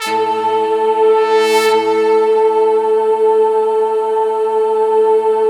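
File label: BRASS, Slow Brass Choir; Optical Media International - Sonic Images Library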